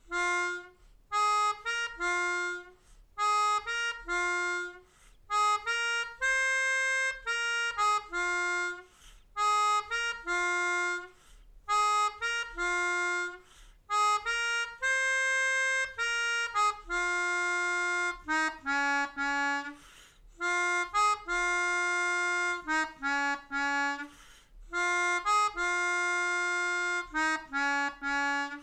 Melodica

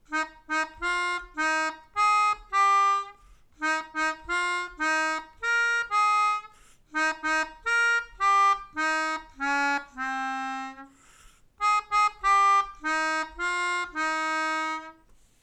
Happy Birthday, Melodica

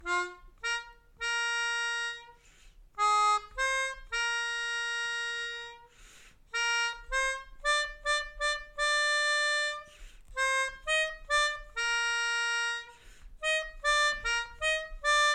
Melodica

